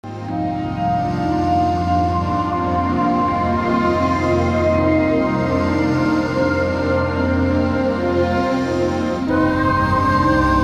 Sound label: noir